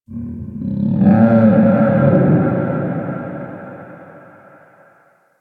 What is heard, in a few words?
Drifter Camp Valley Night Distant Creature; Ambience; One Offs; Warframe; Quests; The New War